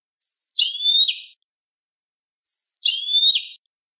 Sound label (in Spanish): quejas comp